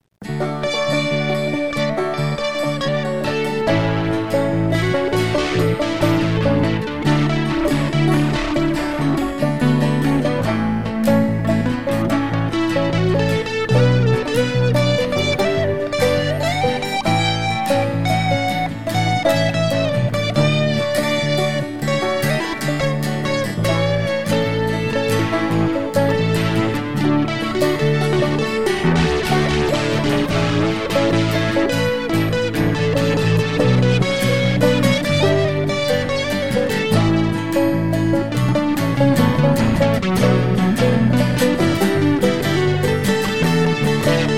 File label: Banjo Test